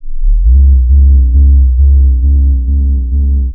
soft and subtle basswaver

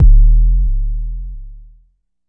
Jomox MBase, drum machine; Jomox MBase 01

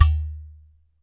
udu HIT, Udu Clay Hand Drum; ETHNIC and WORLD PERCUSSION

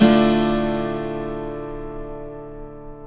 acoustic guitar chords; multisampled

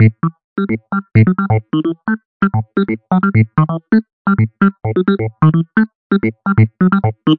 130 electric piano A; KEYS ORGAN PIANO LOOPS

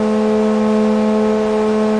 Synthesizer Middle C Notes, Lead

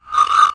guiro; Clangy Crunchy Foundsound Kit

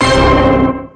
orchestra hit; Punches Hits Discoblasts; single hits

